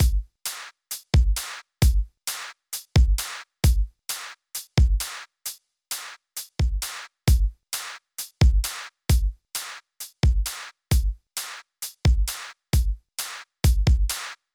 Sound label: Boom Chuck Soft; super crush k.o.